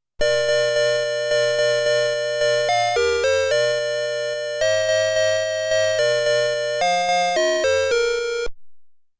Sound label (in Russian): Kolokolchiki